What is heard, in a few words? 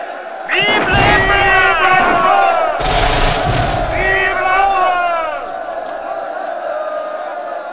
melee